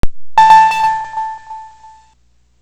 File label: startup